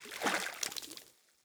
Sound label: fish caught; Rust